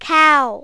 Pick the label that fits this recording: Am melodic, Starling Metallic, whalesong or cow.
cow